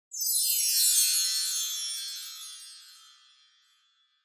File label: Wind Chimes Fast Down Wide